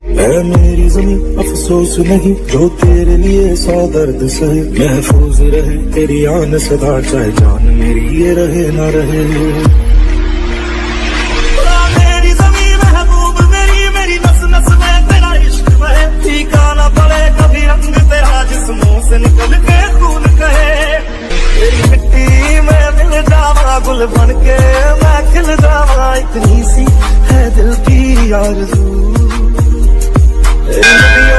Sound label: Dj Remix Ringtone